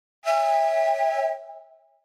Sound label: iPhone 12 Pro Choo Choo Original Ringtone